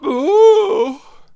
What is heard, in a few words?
Healer Attack Vocals